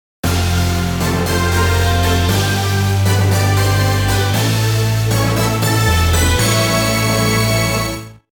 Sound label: RETURN IN TRIUMPH (STAGE CLEAR); R-Type Original Sound Box